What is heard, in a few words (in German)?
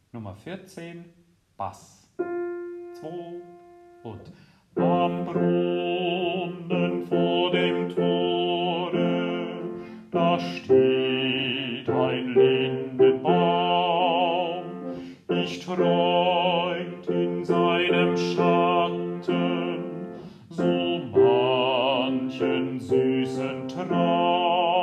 Bass